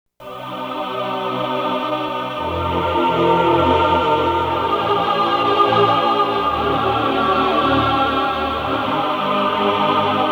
Musical Probe Tone Studies

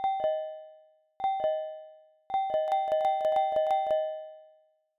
doorbells, synthesis

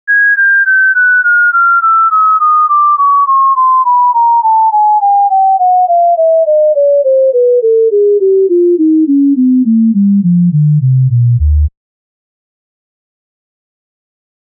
Equal Mel Scale Steps

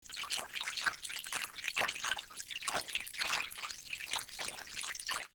water synth

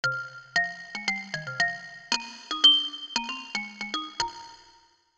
Marimba

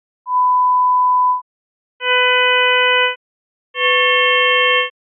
Complex Sounds Loudness

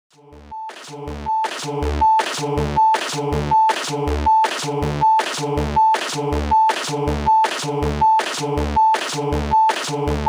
Warren Loop

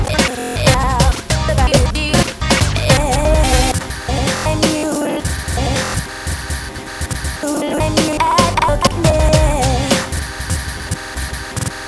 pop recompose

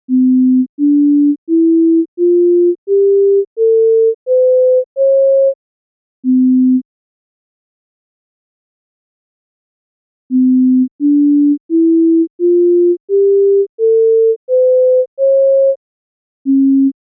Stretched Scales

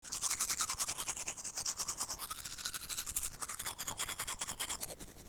brush synth

